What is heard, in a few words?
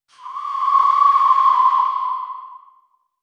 whistle sound effect, soccer